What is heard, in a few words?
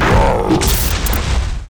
attack